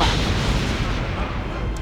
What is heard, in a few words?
Explosion; trek